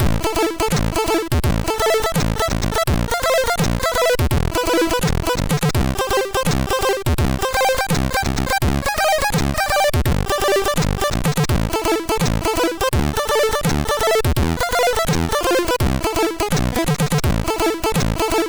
Arcade, sid MOS6581R2; Commando

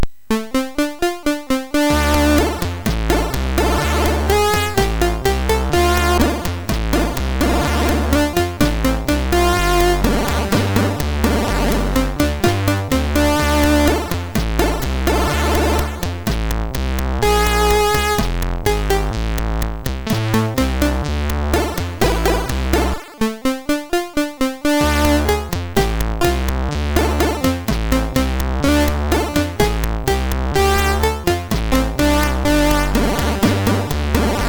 Funk, MOS6581R3

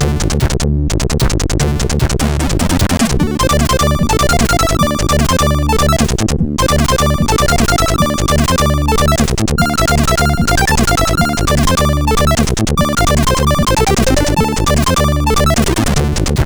Opening sid CSG8580R5; Street Fighter II

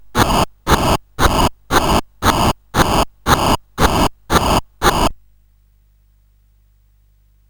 Synth Sample, CSG8580R5

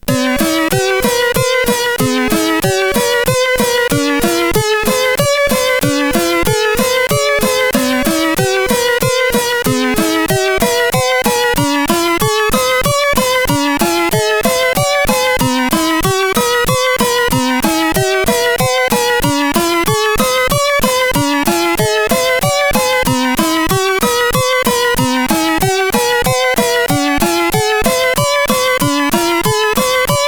sid, CSG8580R5; Moving Sadly; hvsc

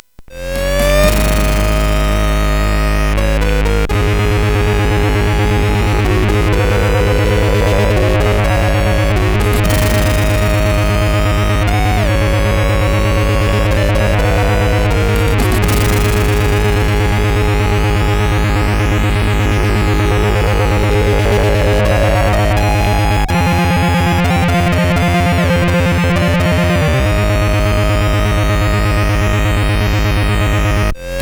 Nonsense, CSG8580R5; hvsc

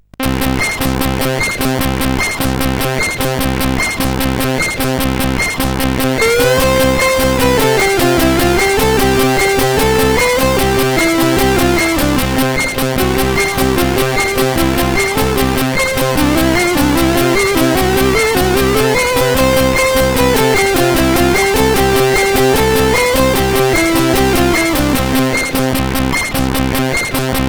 Train Music sid CSG8580R5

